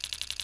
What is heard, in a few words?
chip loop